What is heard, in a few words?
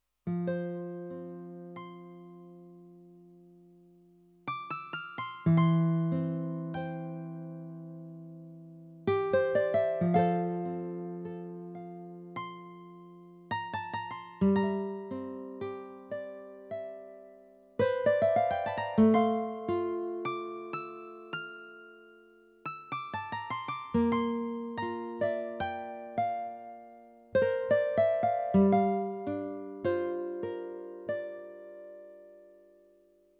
Guitar